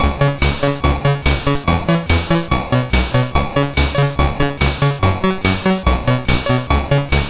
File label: Synth Sample III, CSG8580R5